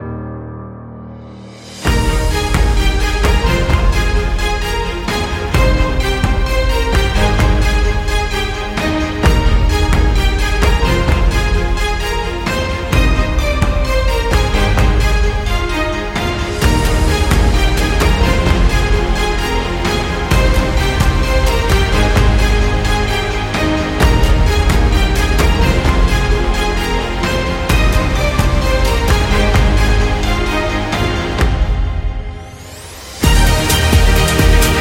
Epic Action

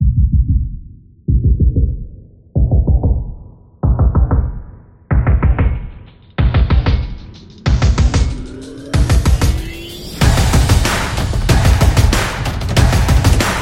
Stomps Power

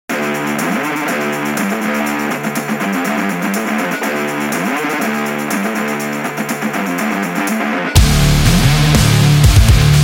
Angry Man, electro rock

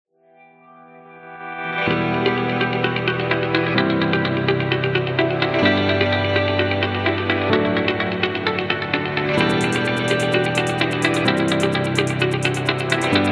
Fast Corporate

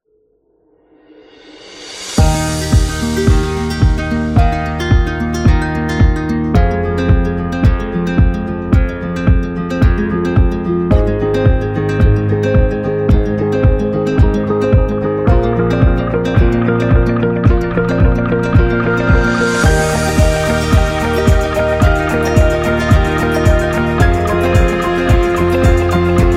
Luxury Background

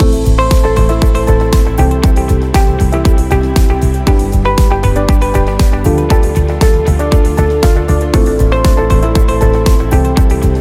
Promo Loop

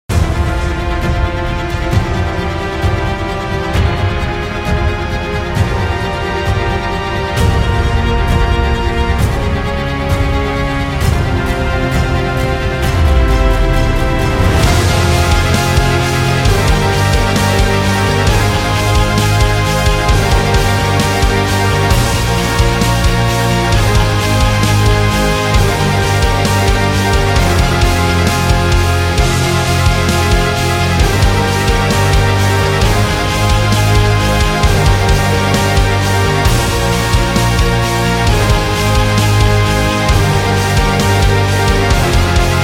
Symphonic Metal Trailer

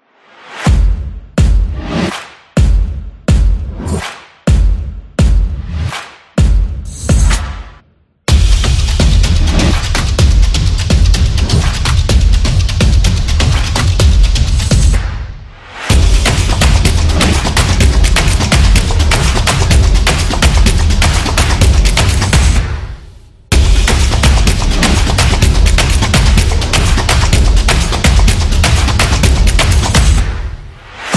Promo Action; crossfit

